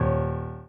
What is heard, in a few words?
D#2 Eb2, Piano